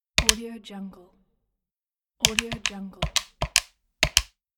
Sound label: handheld game console buttons